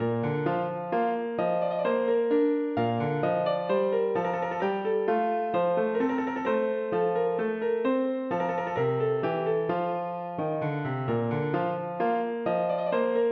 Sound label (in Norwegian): Menuet for piano; Aamodt